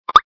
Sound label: item drop; item tweaks; dreambuilder modpack